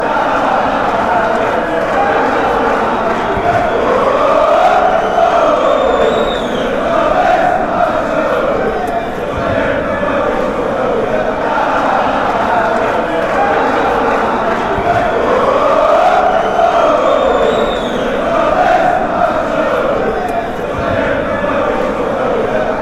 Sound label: beerschot chant